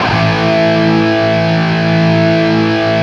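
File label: PWR A L CUT, guitar, power loop cut; East West; Steve Stevens Guitar Samples Collection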